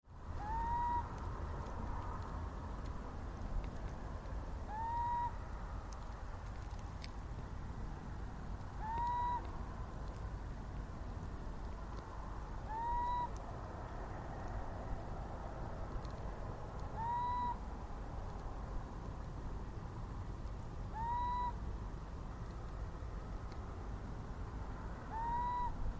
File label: Little Owl